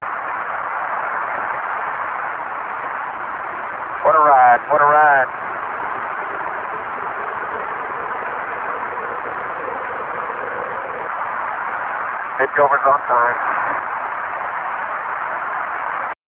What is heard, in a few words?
takeoff